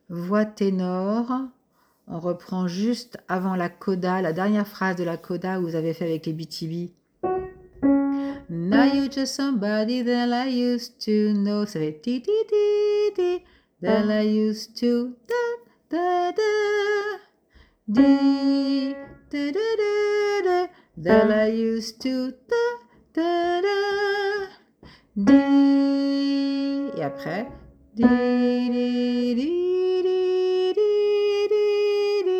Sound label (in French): tenor